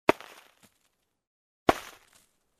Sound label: Throw snowballs over the jacket